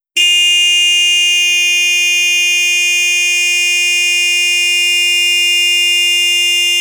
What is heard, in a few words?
stereo, replacement horns